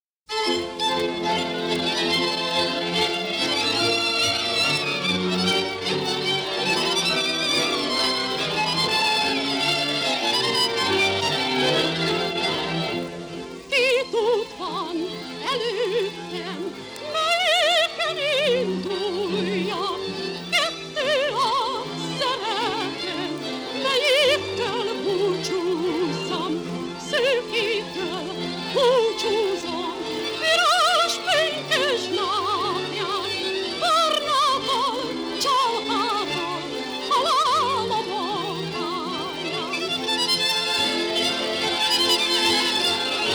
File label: Paloc Csardas Hungary Folk Orchestra; Czompo Hung Fd Orchestra Folk Dancer